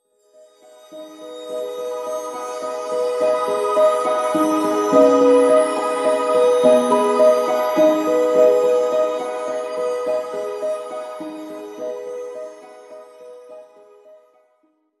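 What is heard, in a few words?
song of discord melody; sona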